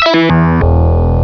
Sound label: Phase Synth; Instruments